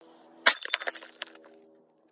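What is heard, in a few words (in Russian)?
звук разбитого стекла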